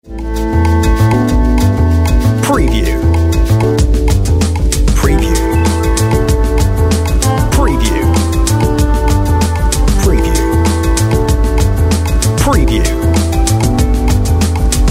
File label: On Hold Music